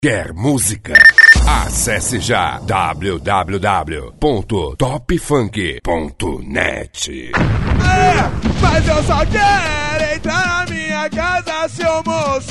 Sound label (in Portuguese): rimas ao vivo